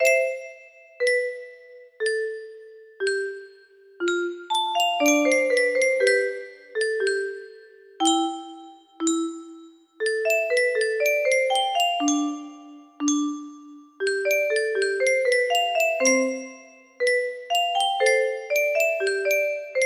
Air on G string music box melody

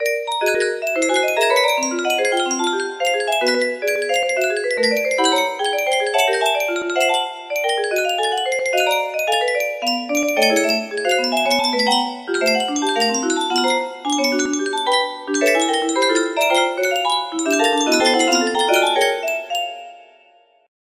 melody

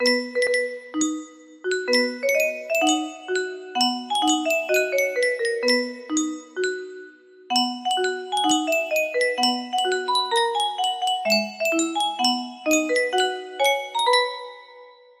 Yunsheng music box national anthem; saudi arabia

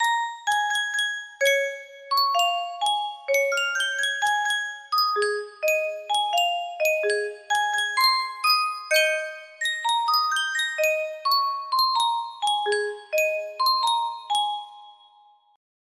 Sankyo music box, music box melody

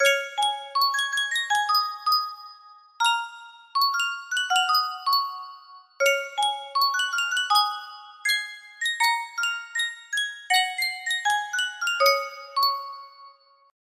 Sankyo music box, music box melody

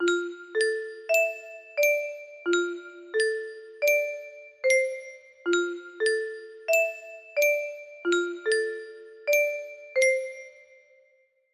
melody

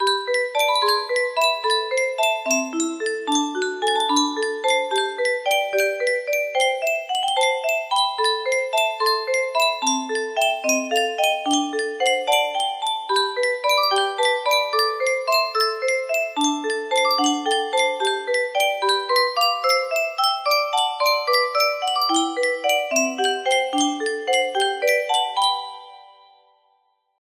melody

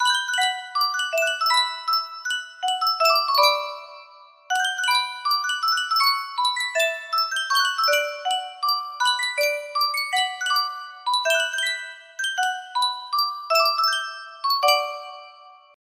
Sankyo music box; schuberts serenade

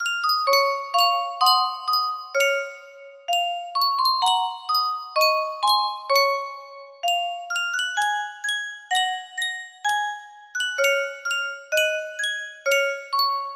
yunsheng music box, melody

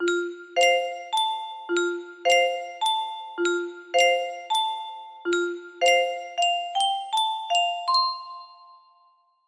melody, music box

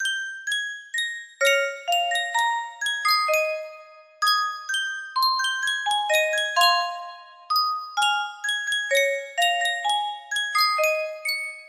Sankyo Music Box

